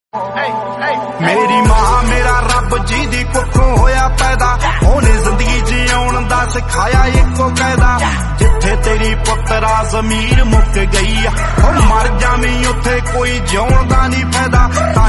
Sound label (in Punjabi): punjabi song